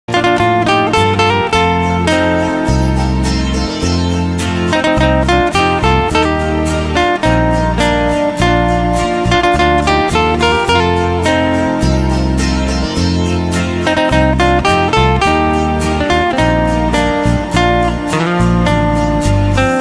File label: Instrumental Acoustic Guitar